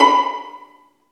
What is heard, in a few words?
Vlns Pizz; Roland L-CD702; Vls Pizz